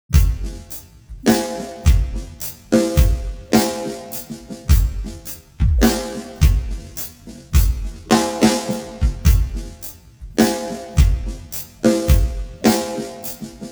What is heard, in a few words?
drums, timestretch 200; bias peak